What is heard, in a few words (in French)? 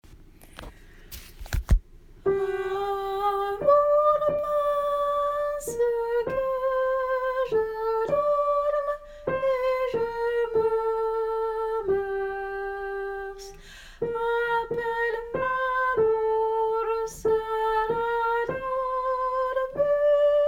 Que je dorme tenor; Amour pense que je dorme; Pierre Sandrin – 1546 19 ème livre Attaingnant